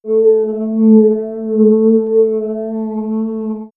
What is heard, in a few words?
Swirls, pads; X-Static Goldmine